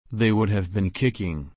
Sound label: kick